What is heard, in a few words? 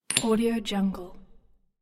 glass impact bottle stopper hit; unfeasible